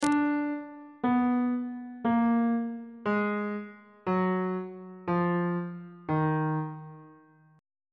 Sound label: d sharp harmonic minor scale descending on bass clef